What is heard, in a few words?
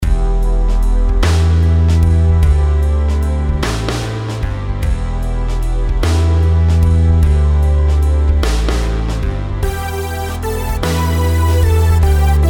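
melodies compound 8s E minor; melody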